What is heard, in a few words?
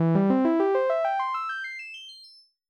Saw Cutoff 1000kHz Key Follow 0; Alchemy